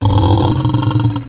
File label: roar; Amiga; odyssey